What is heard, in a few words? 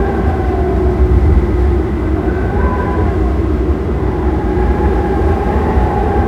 underground wind lp, ambient; Garry's Mod; tf2